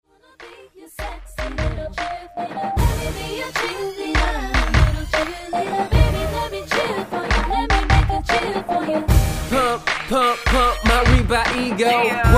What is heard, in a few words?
Cheerleader